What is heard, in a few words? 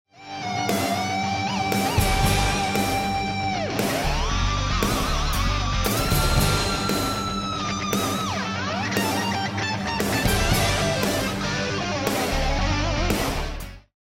choose team; music kits custom